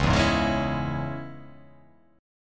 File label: C sharp Minor